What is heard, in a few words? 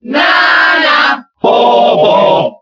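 Cheer Spanish, crowd; Ice Climbers; SSBB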